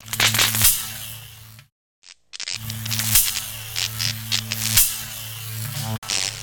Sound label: Wing Lightning, ambience; Ninjago